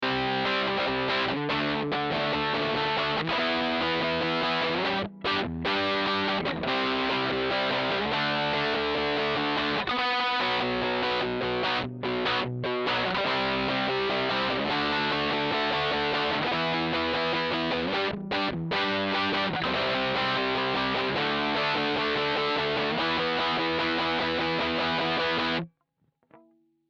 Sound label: Riff; Palmer EINS